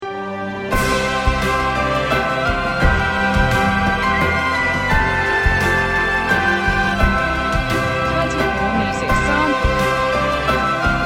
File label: celtic dreams fanfare